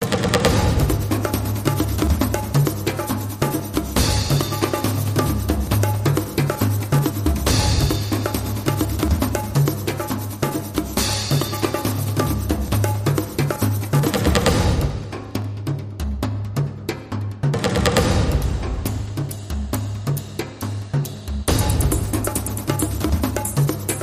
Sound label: Big Percussion Adventure IMPACT